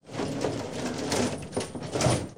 rocket reload

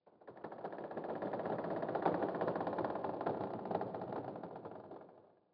sting tree creak; Rust

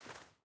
footstep barefoot snow walk; Rust

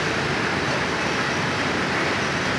industry; ambient; World of Tanks Blitz; map amigosville

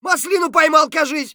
hurt light; Escape from Tarkov; scav3

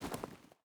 footstep boots gravel walk; Rust

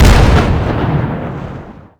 tarantula explode near

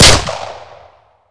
glock; Modern Warfare

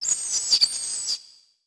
bat; World of Tanks Blitz; hangar halloween 2019

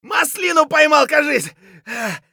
hurt light; Escape from Tarkov

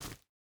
footstep hide forest jump start; Rust

